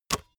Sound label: basketball single hand catch soft